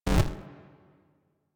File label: Corrupted Notes D; Warframe; Bard Quest; Bard Boss Fight